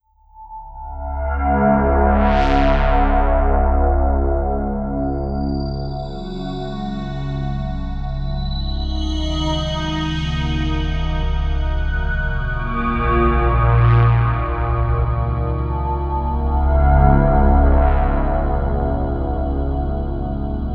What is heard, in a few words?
Open Space Atmosphere; Sonic Foundry (Sony Creative Software); Slow Silhouette